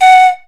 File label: SIKU F#4; The Andes